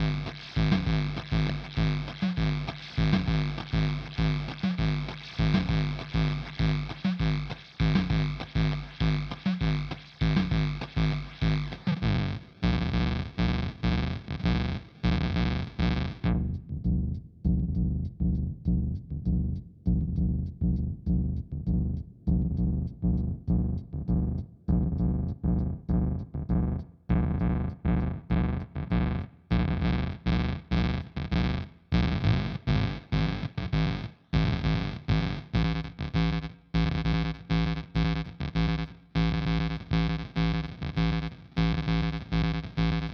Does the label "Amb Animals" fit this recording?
No